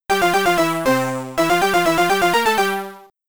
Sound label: Wrong Answer; pub trivia simulator; amiga